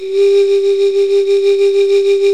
BOTTLES; OMI - Universe of Sounds

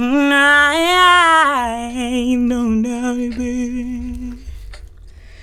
FEMALE VOX, Hip Hop

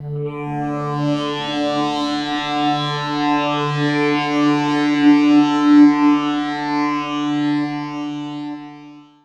Guitar Textures

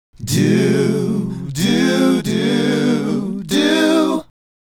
Male Group, Hip-Hop and RnB Vocals

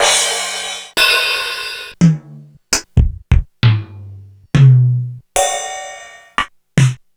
Korg DSS-1

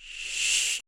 shakker

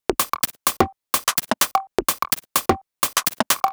Top C# 127bpm, House Drumloops; Zero-G House Fabrik Drumloops; ZG Drumloops

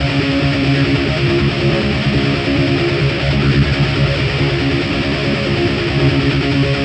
Distorted Guitar 140BPM C#min; Distorted Guitars; Vengeance - Essential Clubsounds Vol.4; Guitarloops

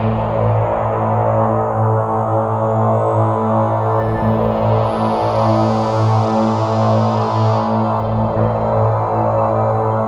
multipad; Sonic Foundry (Sony Creative Software); Ugly Remnants VOL-2; UR 2 FX (Long)

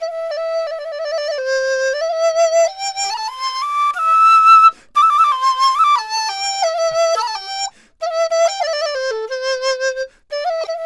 DIZU, 90; INIS - Opium; DIZU 90-C

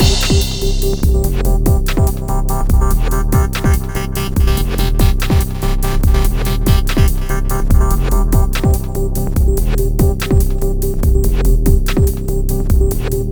Hardcore Breakbeat, 144 Cmin; Big Fish Audio; Hardcore Breakbeat Action; Vertigo

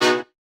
chords

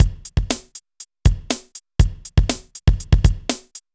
Synth Loop, BPM120

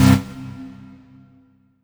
STAB Copper Lead; Polyend; Plughugger

